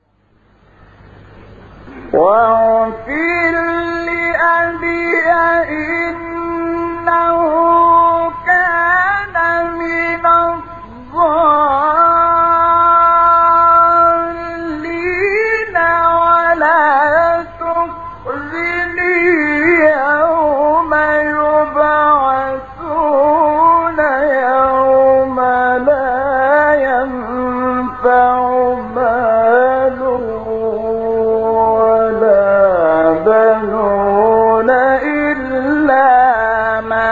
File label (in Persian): تلاوت زیبای از آیات تا سوره مبارکه شعرا; استاد شحات محمد انور; 86; 89